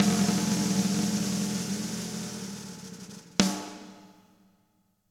SD ROLL

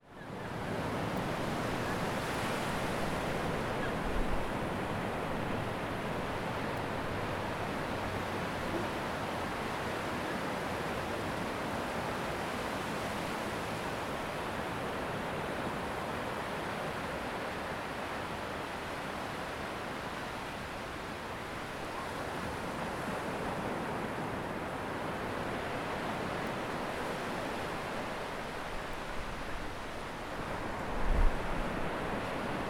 sea

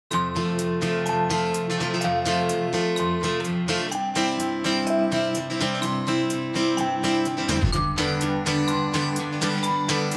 BGM Pop; DIGLOOPS

